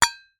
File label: tin metal small hit knife; foley; zapsplat